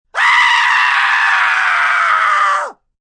Scream Shriek M, Scream, male, high-pitched, fear, terror; human voice; Screaming